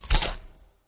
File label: garlic crunched; Bunnicula - Juicy Bites